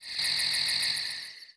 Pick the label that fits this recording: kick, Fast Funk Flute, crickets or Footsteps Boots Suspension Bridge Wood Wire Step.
crickets